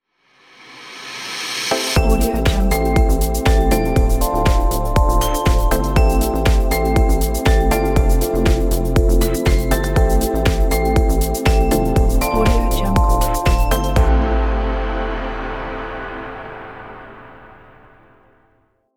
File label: logo ident intro